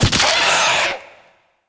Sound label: Bird Of Prey Death; Ambience; Eidolon Plains; Eidolon Landscape; Ostron